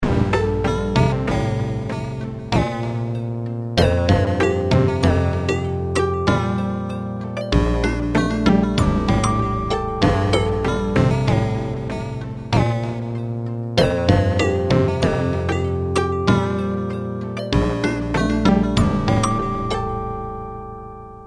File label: Kawai K1 decay analog